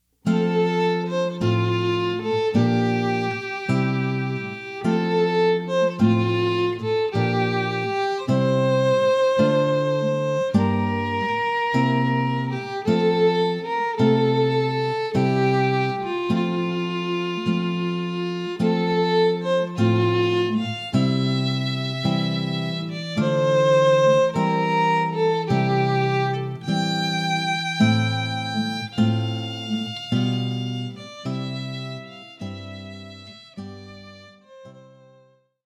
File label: Air from Water Music